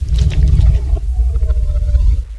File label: laser start; CSO KrakenBoss